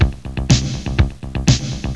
intro sound; Galaxis

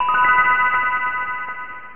got Bonus Items; winter challenge

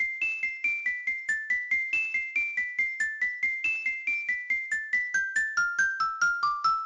fill; Pong; music; ice

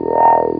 sound torture droid; MOTS

